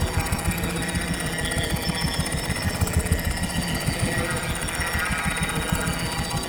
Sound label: Loop; Trapper